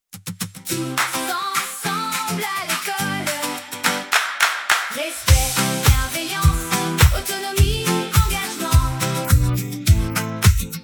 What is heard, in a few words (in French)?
instrumental avec choeurs refrain; soutien aux comportements positifs